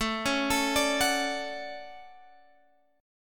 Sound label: D Major 7th, chord